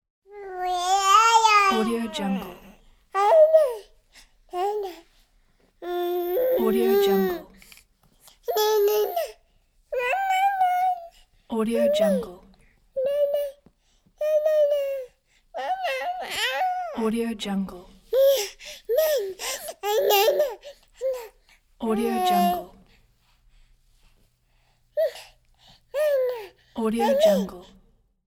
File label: one year old child babbling